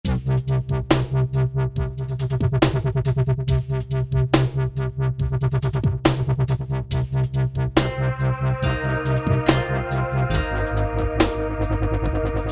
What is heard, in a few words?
Dubstep, synth